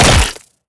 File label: bite, headcrab